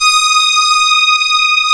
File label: CMI ORGAN